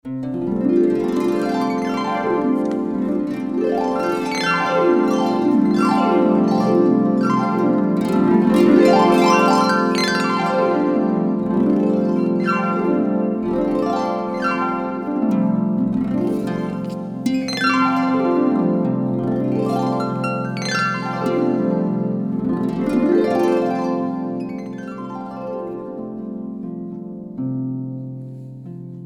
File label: Harp Glissandos; Illumination Harp Recordings